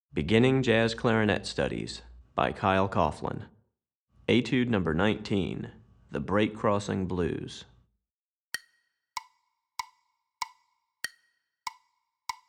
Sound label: Etude, Jazz Clarinet; Recordings For Beginning Jazz Clarinet Studies, Volume 2; Etude #19; The Blues